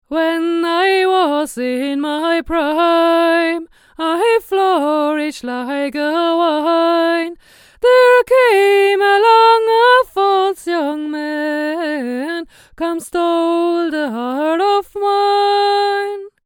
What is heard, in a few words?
Blue BabyBottle H1 near